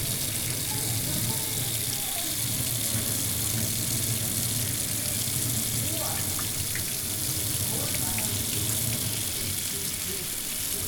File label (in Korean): 물소리